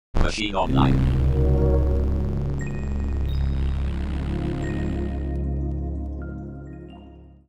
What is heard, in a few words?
startup